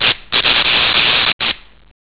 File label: zap